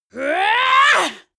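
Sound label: female combat attack long, screams; schreie; menschen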